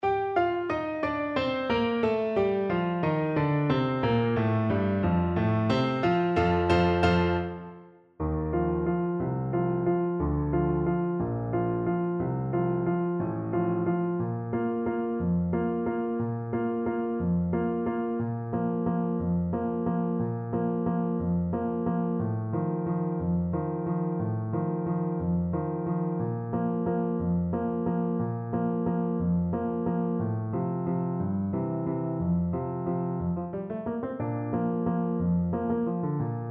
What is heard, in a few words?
Bassoon